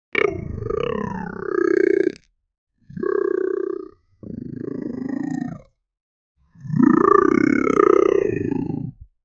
aquatic animal reptile amphibian

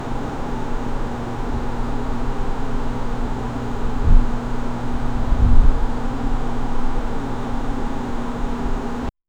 low hum of a ceiling; neighborhood

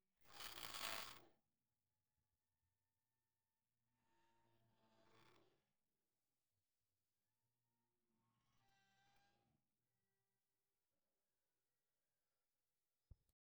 claw machine going left, arcade

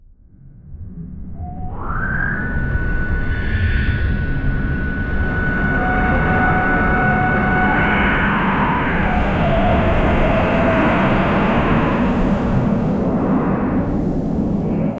sci-fi dark antiutopia; dark ambient

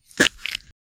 brittle gasp hiss, bone crack

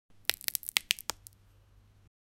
sound of bone cracking, human body

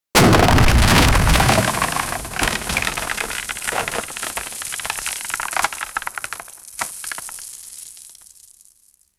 a star collapsing with

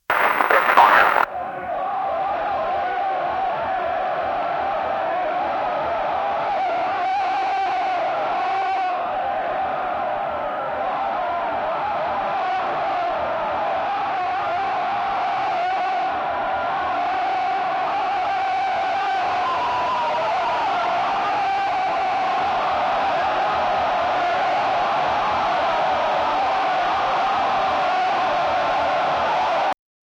the noise of the medieval, battlefield

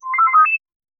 ui message

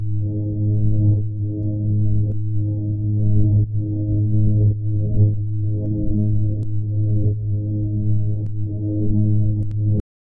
a synth pulse that echoes

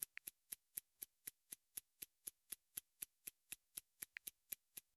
deep luxury watch tick

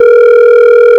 phone ring tone

